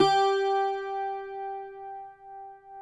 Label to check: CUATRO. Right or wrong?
Right